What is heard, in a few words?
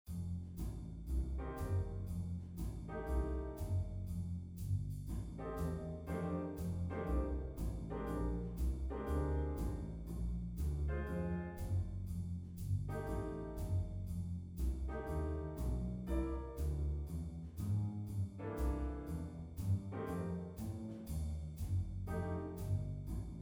blues; jazz italia